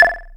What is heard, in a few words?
bleep, Korg Electribe ER-1; Kit 13